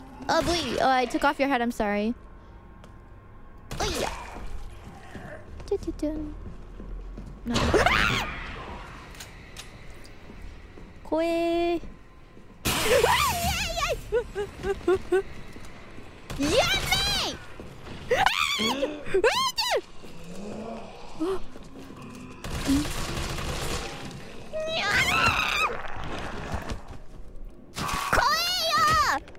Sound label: struggling; IRyScream